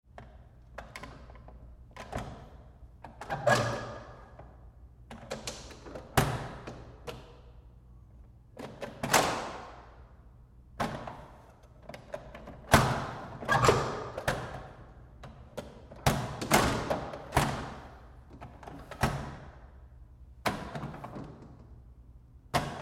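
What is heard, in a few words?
Door Metal Commercial Push Bar interior Bar Grabs-Moves; Doors; Big Room Sound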